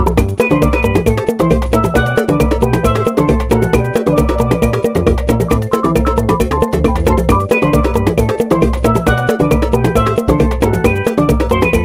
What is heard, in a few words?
beach; mario wii